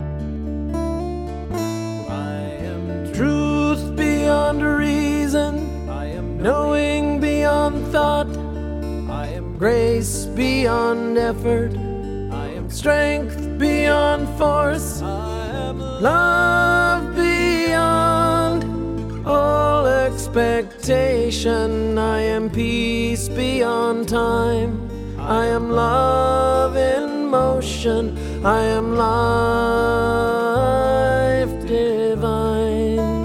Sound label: A Prayer Beyond tenor or low alto, peace choir